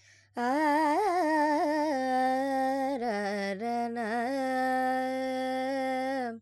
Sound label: Lydian, Vocals, Carnatic Female, Alaps, Dry; 70 G; Sample Banks